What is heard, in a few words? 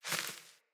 Sparrow One Wing Flaps; Animals